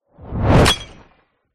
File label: sound design shockwave whoosh into glass and debris smash